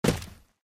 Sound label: taunt flip land; video games; source engine; taunts